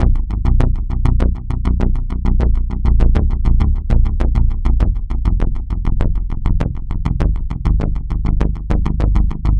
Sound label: Bass Line; Eclectic Electronica